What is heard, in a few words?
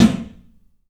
CLUB SNARE; 3D Sound; 3D Drums